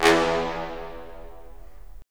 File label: BRS, Roland LA Composer